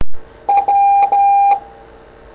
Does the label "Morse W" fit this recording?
Yes